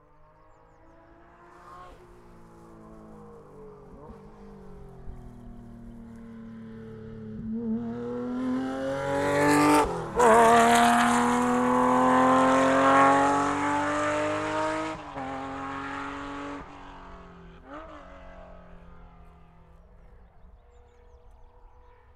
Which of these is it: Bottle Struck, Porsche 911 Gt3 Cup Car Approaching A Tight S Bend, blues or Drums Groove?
Porsche 911 Gt3 Cup Car Approaching A Tight S Bend